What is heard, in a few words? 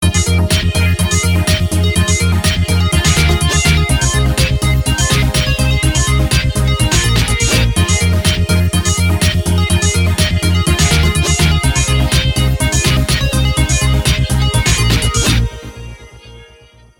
blue lightning tone, ringtones